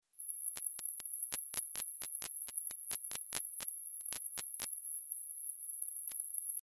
Tessellana tessellata; BIOAC ORTH

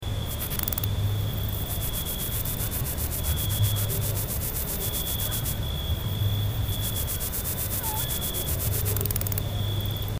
Platycleis affinis; BIOAC ORTH

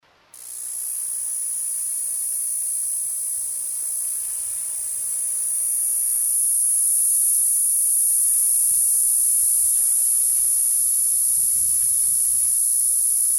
Tibicina corsica corsica

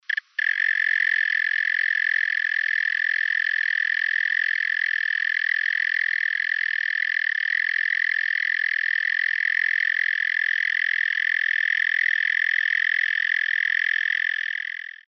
Gryllotalpa gryllotalpa; BIOAC ORTH

